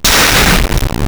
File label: Scala, Impulse Responses, Rear Left; Ambiovolver